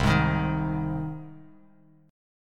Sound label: C Minor Sharp 5th C down Bass Standard; strummed; JGuitar